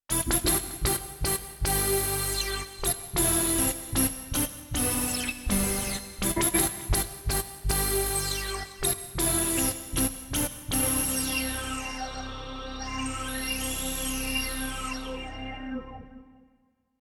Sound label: Brass, Aerophone AE-10; DJP-8000